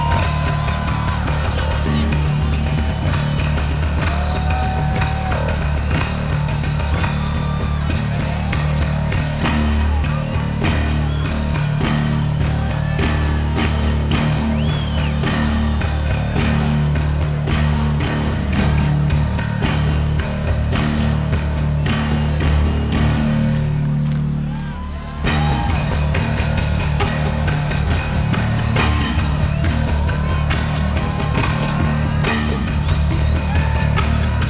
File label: Bass Solo; live In Brisbane; Soundwave Festival